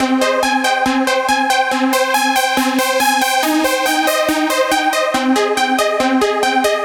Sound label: Sweet Lead; Звук синтезатора Техно-транс; Techno Trance; 140BPM; loop; Synth